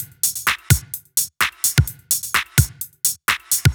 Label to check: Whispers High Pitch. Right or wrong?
Wrong